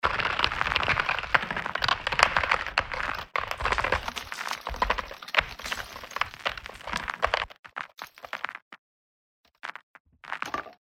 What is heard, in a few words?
ice breaking